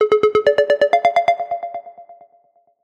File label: Minimal